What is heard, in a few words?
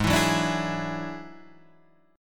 F sharp 7th Suspended 2nd Sharp 5th, G sharp